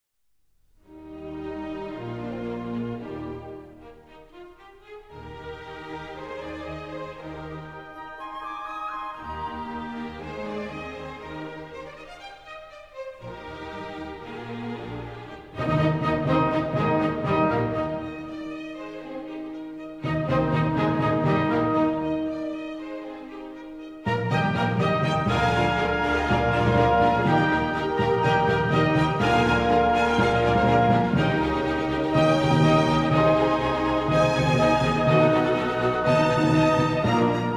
Trumpet Concerto in E-Flat Major; Hob. VIIe1; I. Allegro

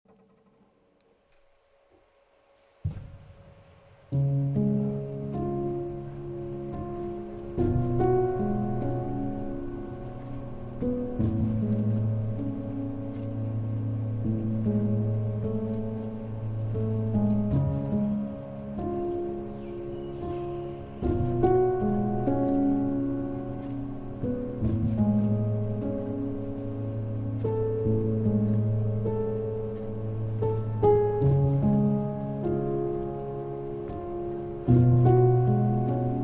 Piano

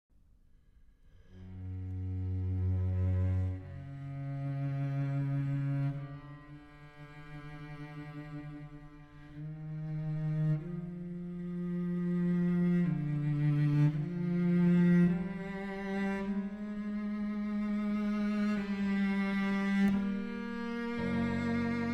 Sonata For Cello and Piano Largo; Op. 40

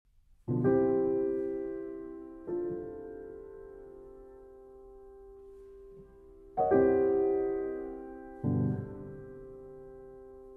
Harmonica, Grand; Grand Piano; Kurtág

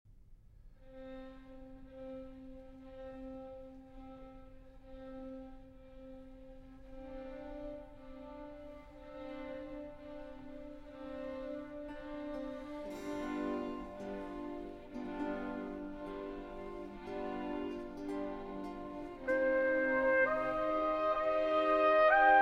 Oboe Concerto in C Minor, Adagio

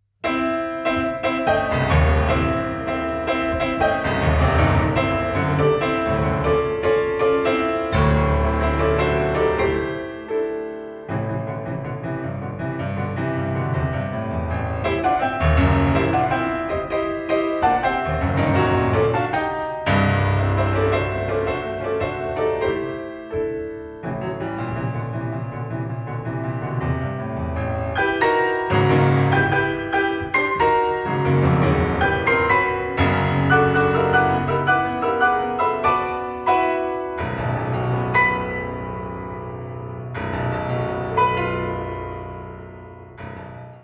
Piano Sonata, Allegro Marcato

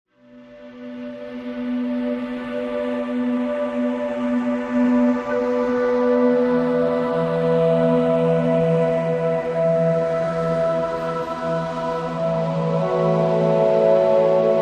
Nanxiao Angelic Meditation

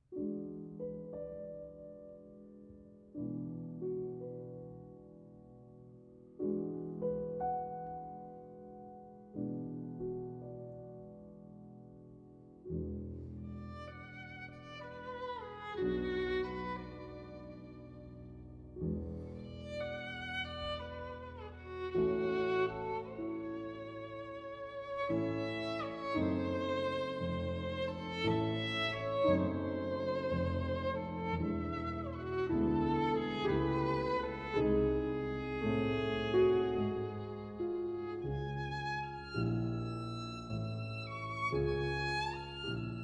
Sonata For Violin and Piano in A major; Franck; 1. Allegretto ben moderato

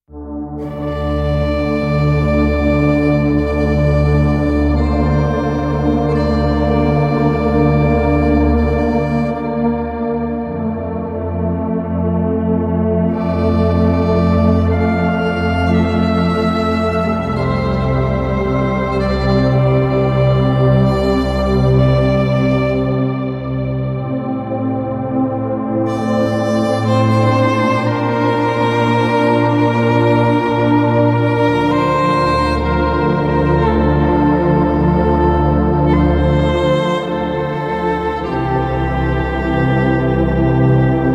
Violin

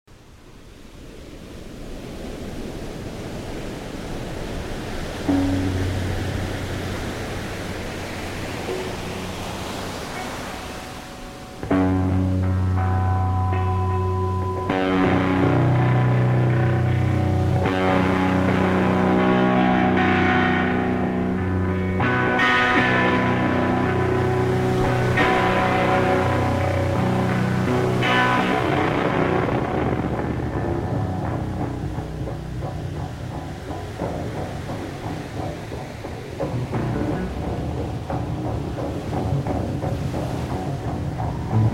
Guitar Solo

